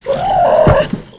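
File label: Npc peaky turtle die; Fly For Insanity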